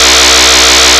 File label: lead; Mono